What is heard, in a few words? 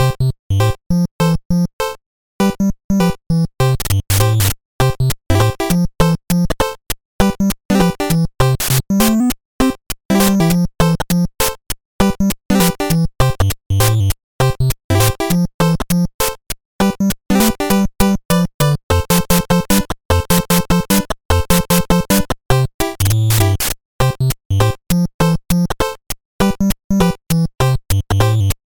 Custom Level, BGM; Super Mario Bros. Remastered; JHDev2006